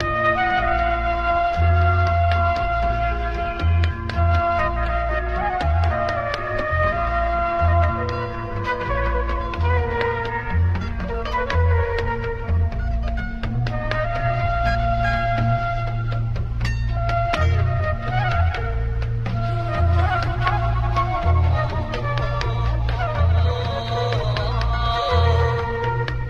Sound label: flute, Loops